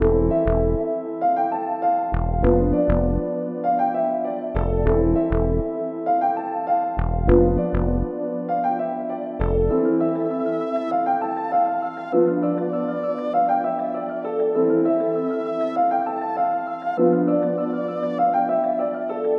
West Coast Melody Loop - 99 BPM A Min; Cymatics; Oracle Sample Pack

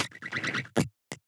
perc oneshot; GhostHack

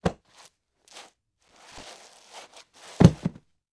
Slide Down Wall Body Fall; Fairlight Sound Lib

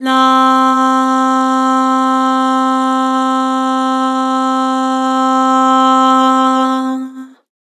"Lah", Vocals; Harmor

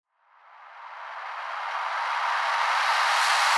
Reverse Breath; FX

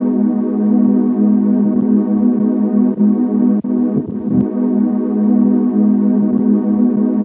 Tape Loop Pad - G# Min; Pads; Cymatics - Eternity Sample Pack

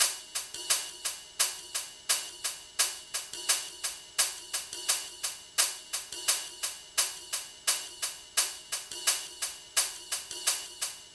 Hihat Loop - 86 BPM; Drum Loops; Cymatics; Eternity Sample Pack

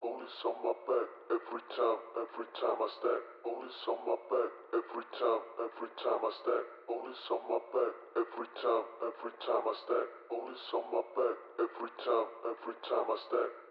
Drum Loop - 140 BPM Vocal; Loop Stems; Cymatics; DOPE DRUMS VOL. 1; Wanted

